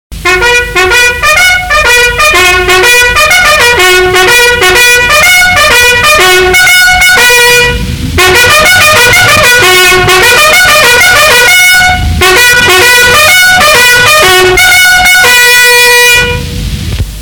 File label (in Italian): sveglia militare